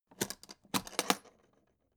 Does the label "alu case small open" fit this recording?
Yes